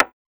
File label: Perc